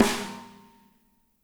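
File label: snare left; Spectrasonics; Burning Grooves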